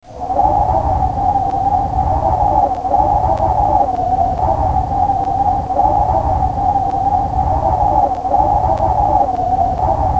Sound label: storm; Stanley's Sticker Stories (1997)(Edmark)